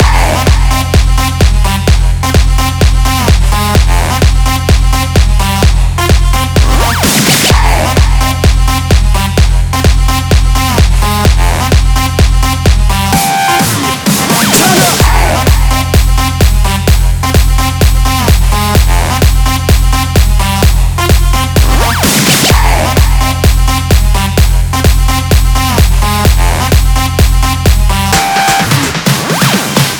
128 BPM Mix, G; Vengeance - Essential Clubsounds Vol.5; Demo Constr Kits; Sidewalk Kit

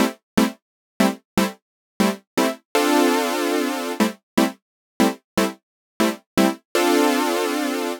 Chord Synth Am, 120bpm; Zero-G; Extra Chord Progs

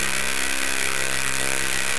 SCI-FI; E-MU Formula 4000 Series Vol. 3; Analog Odyssey

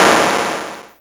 snr; Mono

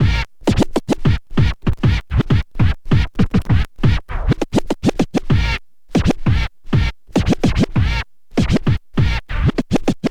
scratch med, Vinyl Attack scratches mix

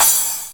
909 HITS; AMG Megabass REMIX! VOL-2; SINGLE HITS

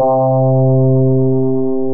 Grittarr; ST-XX Amiga Soundtracker Sample Packs

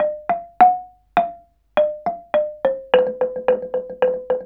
KULINTANG; Spectrasonics; Heart of Asia; KULNGTANG PH

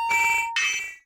MET SW SIN, SWORDSING

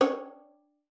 FING C#5, VIOLIN; Xsample VOL-5

